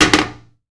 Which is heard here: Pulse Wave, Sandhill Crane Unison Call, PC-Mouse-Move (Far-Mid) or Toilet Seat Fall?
Toilet Seat Fall